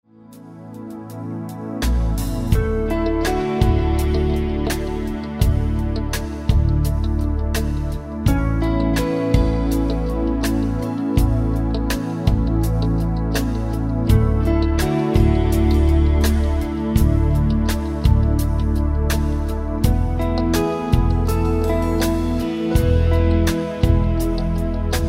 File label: Backing Track